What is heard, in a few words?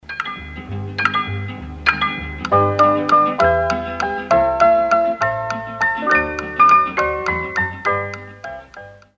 intermezzo; magische klok